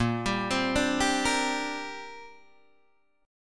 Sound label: Bb 6th Add 9th Bb Guitar Open D